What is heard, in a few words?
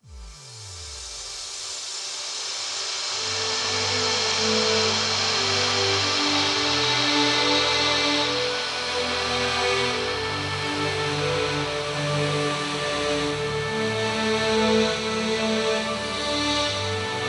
Orchestral Bridge